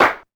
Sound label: Clap, drum machines, Sequential Circuits Drumtraks